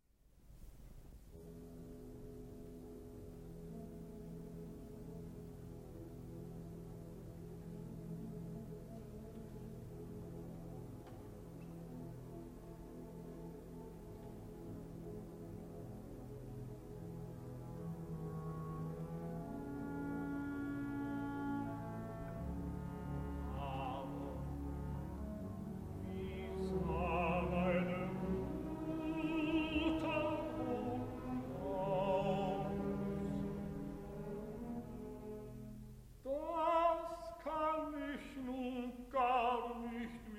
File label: Forest Murmurs; Wagner; Siegfried, opera, WWV 86c